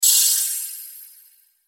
sound hit heal; Monster Arena

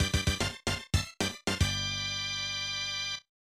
Victory!

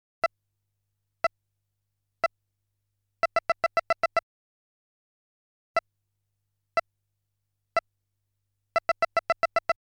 (Sound Effect) Quiz Time Count, String Quartet

